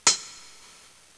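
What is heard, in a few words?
Cymbal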